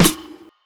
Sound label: Snr